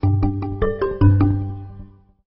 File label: Chime